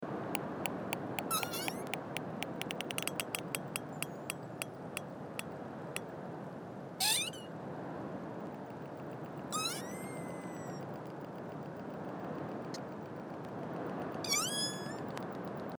a whale calling out for its group as it returns from diving for food with ship; pilot whales; ship noise